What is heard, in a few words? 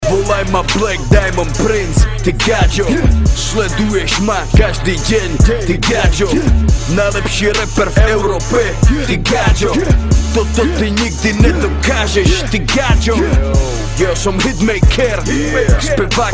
song; admin plugin